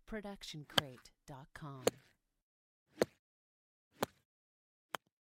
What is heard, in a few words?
golf club sand wedge bunker stereo; soundscrate